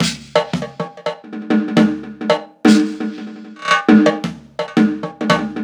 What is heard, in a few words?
Mixed Percussion, percussion loops